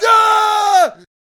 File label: Super Boxer Attack Vocals